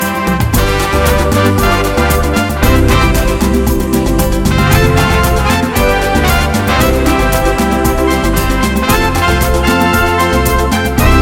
Smooth Hot Rumba

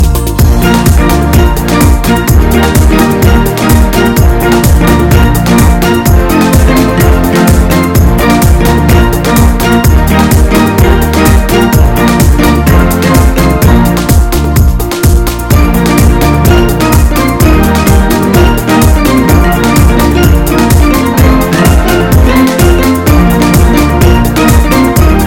A Hot but Smooth Dance

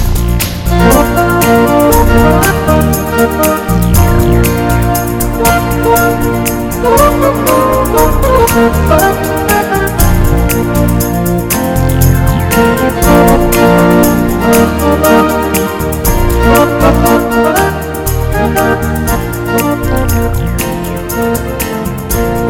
Beautiful Guitar Melody

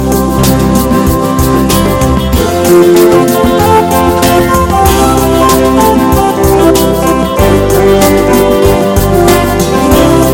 A Guitar Beat is Special

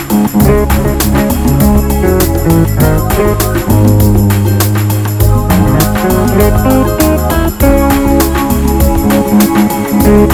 Great Lovely Sounds

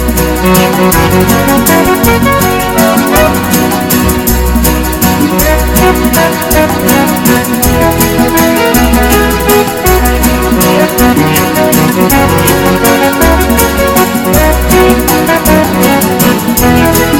Great Fast Waltz

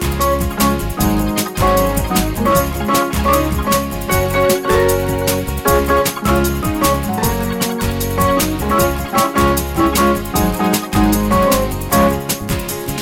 Fast Hot Rock

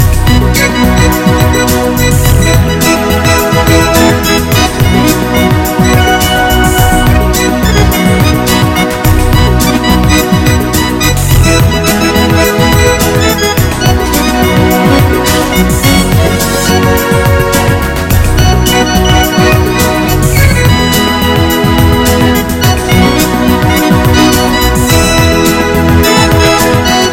Smooth Easy Pop

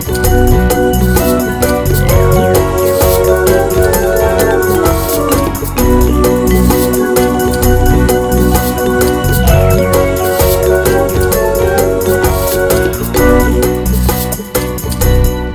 Smooth and Relaxed

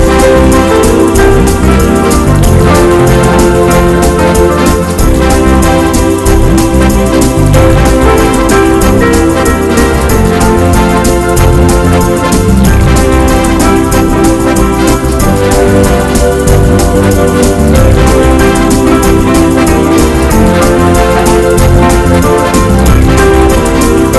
Great Band Swinging

